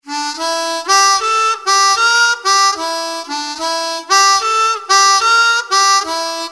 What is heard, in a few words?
bend, harmonica